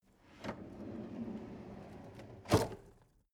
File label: drawer medium open draw long hard